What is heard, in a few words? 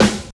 SWING SNR; East West; Phat & Phunky